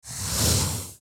Pencil writing a single line

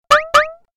Cartoon eye blink

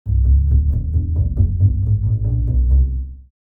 Sneaky cartoon ascend

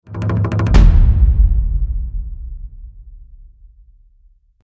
Tribal drum impact cinematic hit

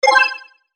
Tech alert tone digital futuristic

